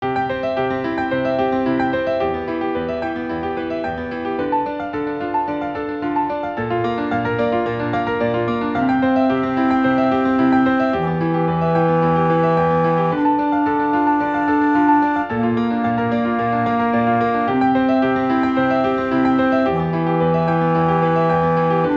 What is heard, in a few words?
Classical